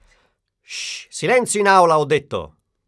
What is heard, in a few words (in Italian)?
SILENZIO